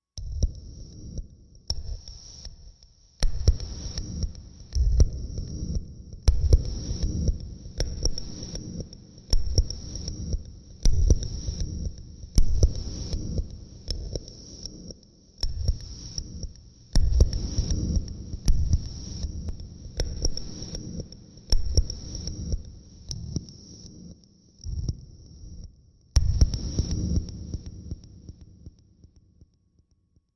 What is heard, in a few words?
Electric Air, Machine, Electric Arc, Heartbeat, Air